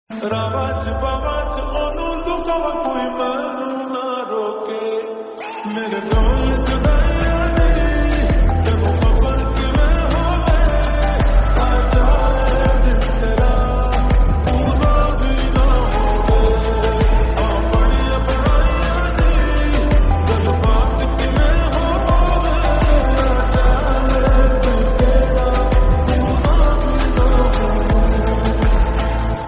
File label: punjabi ringtone